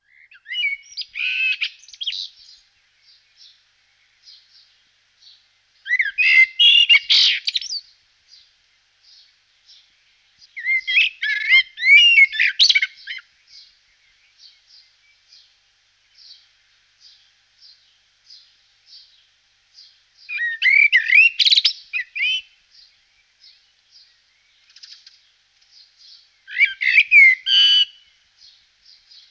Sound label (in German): Amsel, Voegel